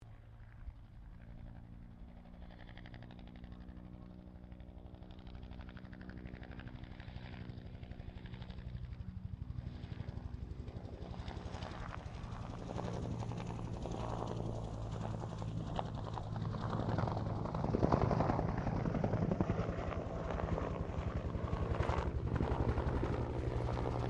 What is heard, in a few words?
HELICOPTER BELL UH1 IROQUIOS PASS; RAN UH-1 Iroguios; Helicopter Sound Effects